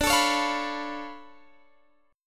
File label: D Minor Major 13th, down, Guitar, Drop D